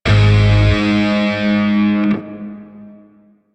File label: Shawzin Min Chord; Grineer; DE; Warframe